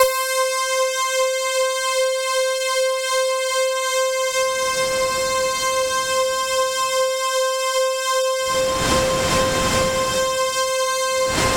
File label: C3, Synth Line; 90 s Sample CDs; NiceBeats; SynthLine VOL-14